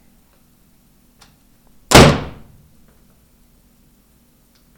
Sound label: Wooden Door Slam